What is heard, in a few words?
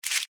Card Move; Yu-Gi-Oh! Master Duel